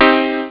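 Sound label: piano minor; jazz jackrabbit 1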